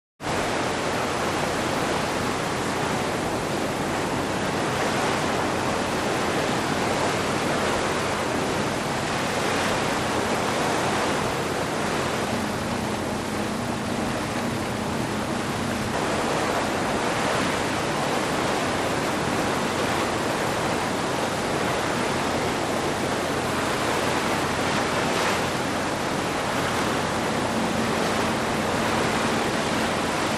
Bow Wash 3; Ferry Boat Deck, Steady Cruising Speed.